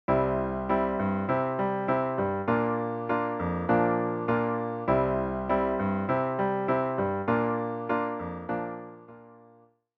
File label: vamp, piano rendition of the chords, C Bb; song intro; 2-Chord Vamps